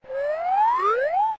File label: Grow; Geschicklichkeit; Hamsterball